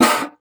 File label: PUSTE, BASSOON; Xsample VOL-6